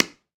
Pickelball Paddle Hit Ground Medium, Ball Collision